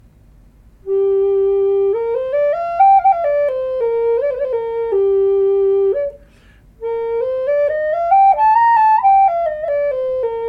G4, flutes

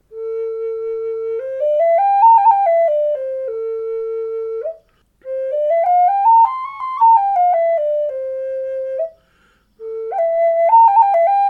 A4 a448, flutes; A4 a448 in Primavera; with a Pepperwood; bird; Ugly Boy Flutes

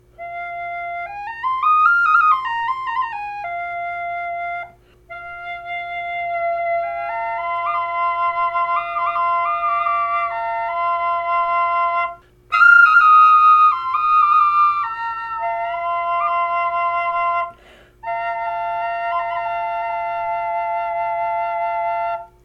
drone F5, flutes

